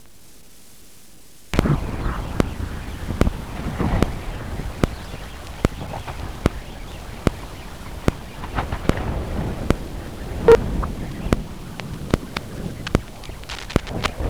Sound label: Vostok mag; arctic antarctic